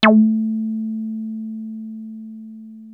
303 A3, TB 303; Hollywood Edge; Giorgio Moroder Rare Synthesizer Collection

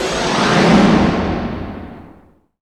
NOISE, Production Effects

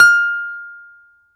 BALALAIKA, Prima Balalaika, Plucked Strings

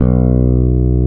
fretless bass

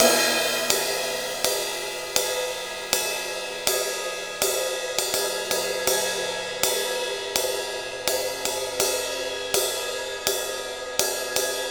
Ride Cymbal Pattern

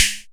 Dry Snar, dry snares; Quasimidi Rave-o-lution 309

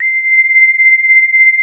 PAUL LEAD, ARP, Analog Fantasies; USB Soundscan vol.49; AKAI

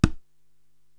Conga Toe

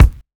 KIT, Dance Mega Drums, HIP; Best Service

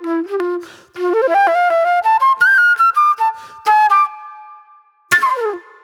Classic Soul Flute

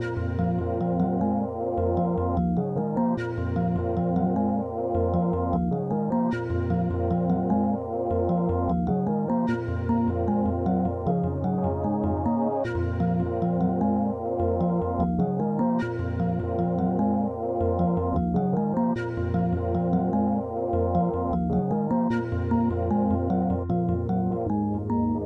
Melody

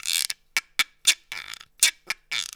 Roots Reggae; Sounds Good

